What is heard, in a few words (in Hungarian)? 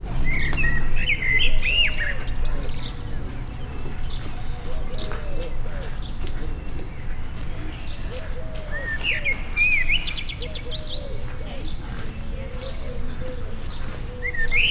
daloskedvű rigó, győr zoo hangulat; gyori zoo 2014